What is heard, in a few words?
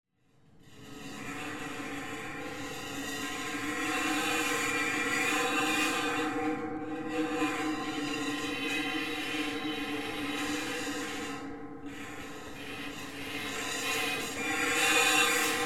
EFX SD Metal plate Tubular sweep; Coll Anderson